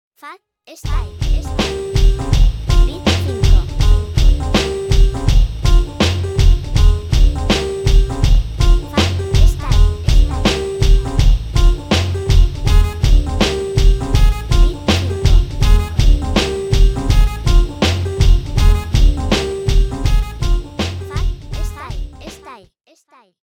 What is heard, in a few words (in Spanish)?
Muestra Beat